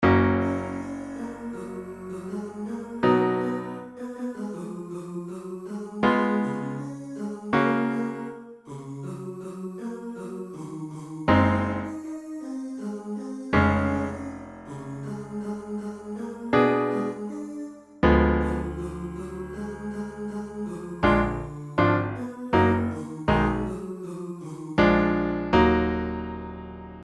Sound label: Piano